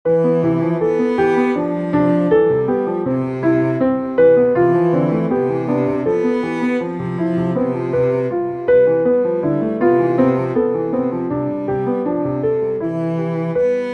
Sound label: Piano and Cello; Bezier Cos